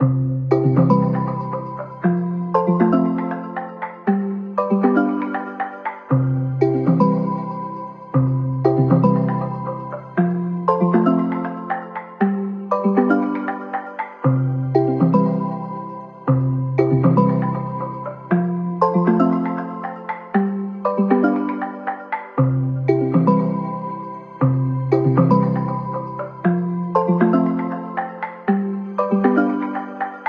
Morning Forest; MagicOS 7.1

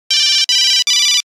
1100 , Nokia Buzzer; Windows Phone apps

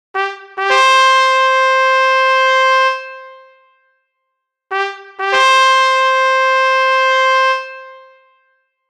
Bugler, Nokia Tones; Windows Phone apps

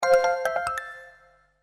Reminder; Sony Ericsson; P900

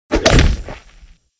Snow Ball Notification; HTC; Snow Flake

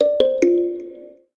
Gentle, notifications; Spark Go 2023

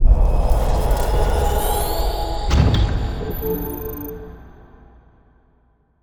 PowerOn; 5GX Quantum; SK Telecom

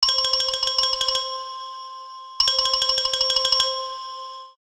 Wooden Bell; KONKA; alarms; SP6

